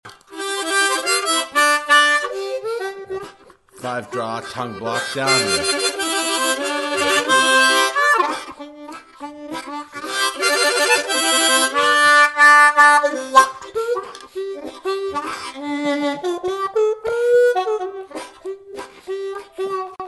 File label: 2-5 Draw Tongue Block Down Riff, harmonica